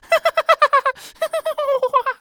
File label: Human Male Laugh Boy Laugh Voice